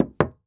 Foley Footstep Cowboy Boots Single Step On Wood Surface; Foley Footsteps